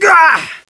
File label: pl pain, player; bts rc